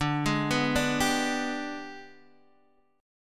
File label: Ab Minor Major 7th Flat 5th chord